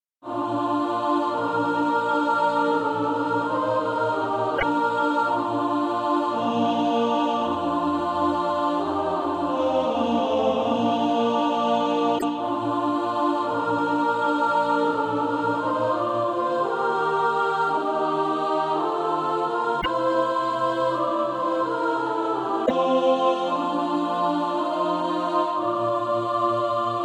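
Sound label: alto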